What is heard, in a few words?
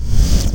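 teleport out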